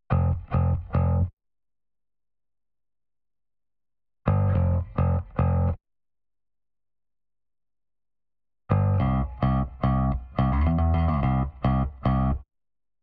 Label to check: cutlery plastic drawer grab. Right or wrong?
Wrong